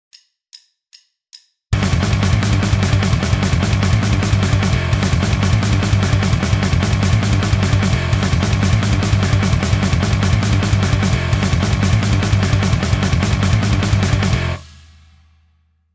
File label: riff